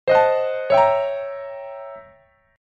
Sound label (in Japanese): 不穏なピアノ危険度レベル